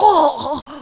shot, player male

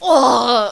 pain, player female

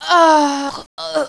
sniped, player female

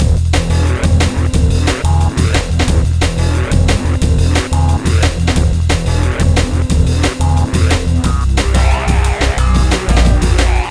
dnb

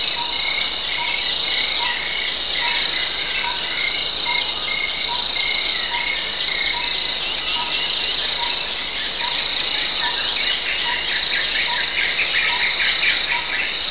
jungle ambience; garns

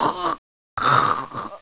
cut, player male

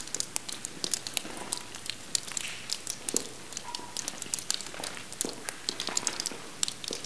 sewer

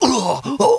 shot, player male

